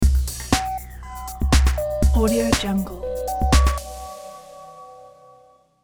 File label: tech news stinger